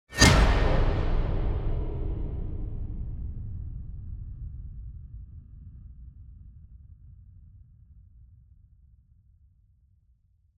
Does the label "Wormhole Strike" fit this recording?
Yes